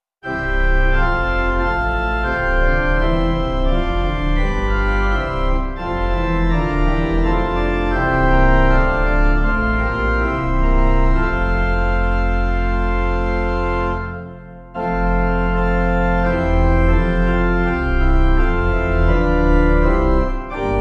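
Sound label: Hymn O Saving Victim Herr Jesu Christ Pipe; Hymnal 1982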